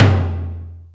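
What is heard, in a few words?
Lo Ring RM, Alesis D4 drum machine tom; 300 Drum Machines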